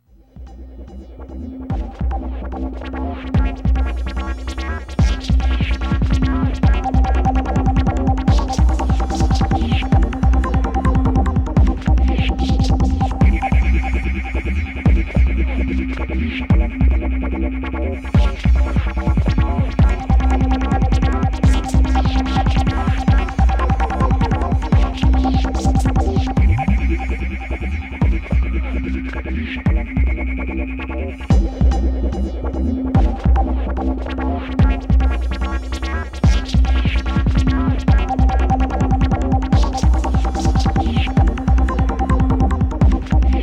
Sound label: menu song